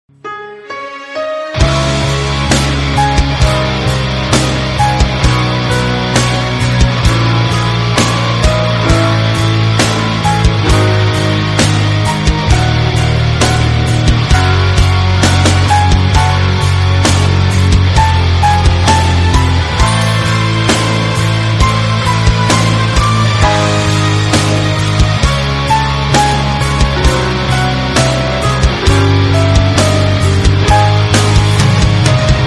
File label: Instrumental